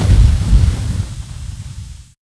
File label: fire; Сильный звук огня